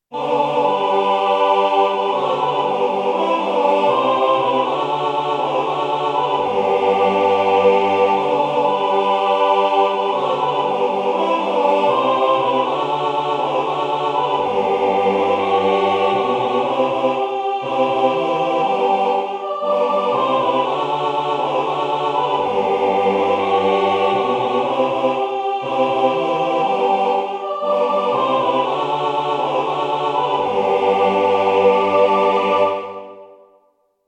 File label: Siyahamba tutti